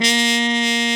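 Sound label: trumpet, harmon dry; Roland L-CDX-03 Disk 2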